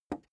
plastic button small simple release

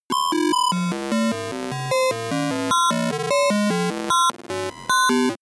broken computer low long

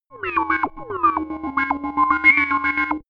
pusling synth

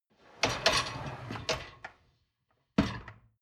dishwasher lower tray draw in soft

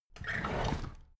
drawer big squeak open heavy

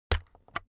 keyboard external key hit slow

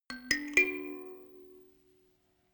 notification kalimba rattle triad up minor low

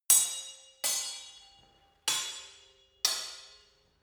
sword hit fast sword freestyle

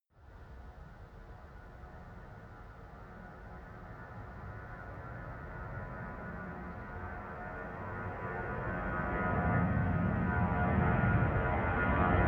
propeller plane flyby land tonal

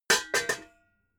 pots hit

